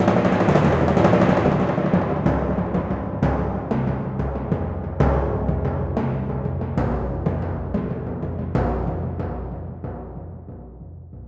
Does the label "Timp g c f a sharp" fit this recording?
Yes